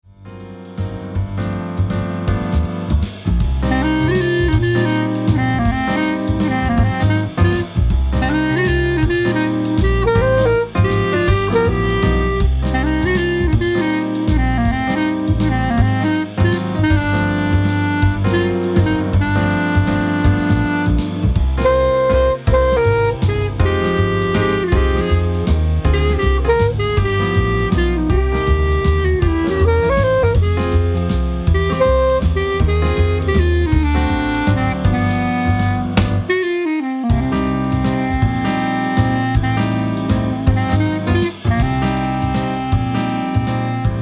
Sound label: Clarinet; clarinet and piano